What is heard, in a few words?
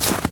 snow step; Golden Crayon Sounds